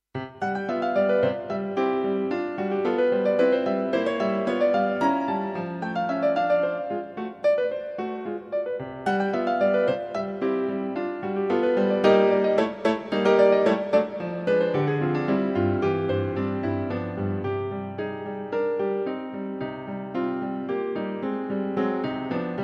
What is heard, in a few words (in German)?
Klaviersonatine; Otto Martin